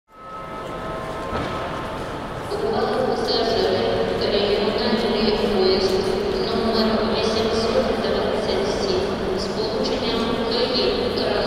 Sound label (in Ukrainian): czentralnyj vokzal Kyyeva